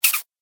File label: Cartoon Pumping Sound